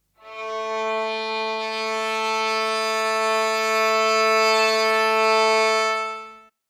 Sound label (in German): Geige; Makam-Musik